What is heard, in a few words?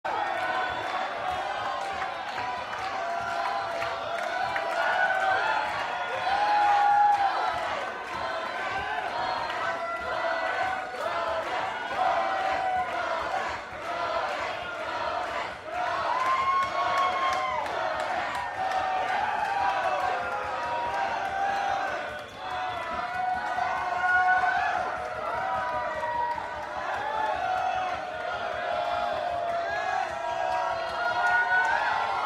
Crowd Banter